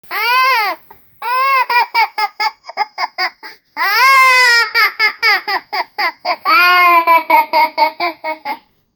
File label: child laughing; happy